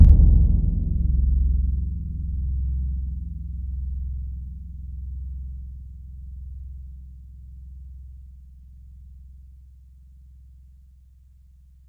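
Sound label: drum Timpani, Wavetables; Synthetic's Serum Collection; Standalone-Music SYMPHONY Wavetables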